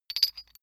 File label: small metal handling chink short; industrie wirtschaft; metall